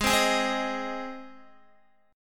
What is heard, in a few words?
Ab Major Ab, Guitar; strummed; JGuitar